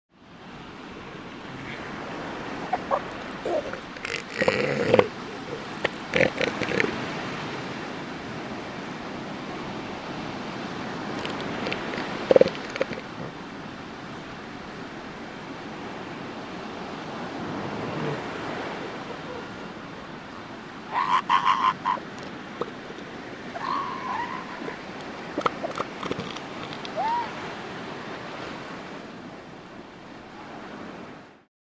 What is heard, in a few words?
Seal Elephant Snort